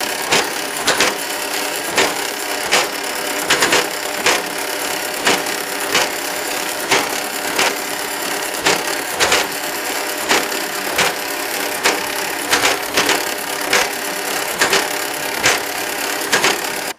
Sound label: rhythmic mechanical teletype puncher clacking